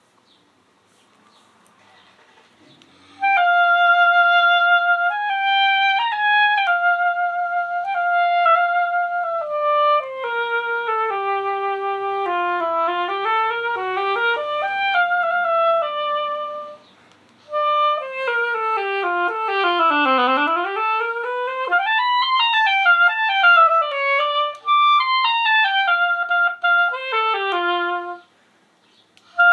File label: Adjusted Legere Noodle